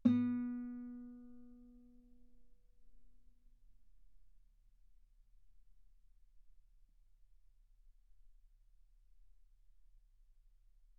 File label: Guitar ff B3; sul B